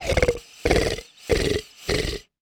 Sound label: Horror Dragon Snorting